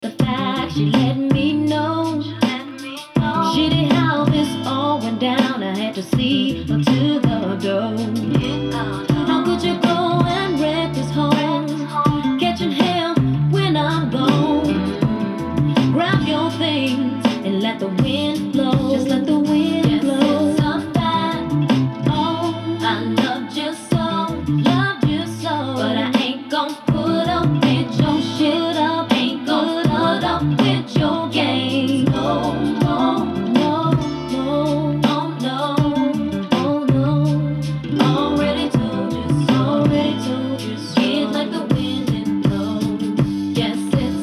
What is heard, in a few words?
Sub